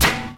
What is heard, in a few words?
Snare